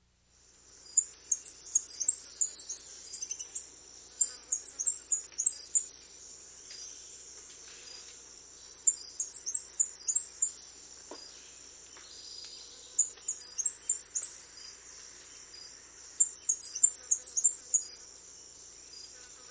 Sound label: Orange-Billed Sparrow; S. America